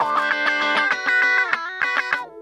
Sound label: Electric Guitar, Loops; Guitars